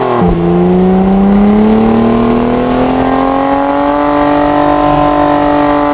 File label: atv second gear to full throttle loop; hl2